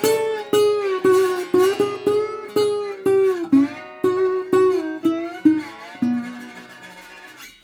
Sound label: 120, Blues Guitar; ILIO - Fretworks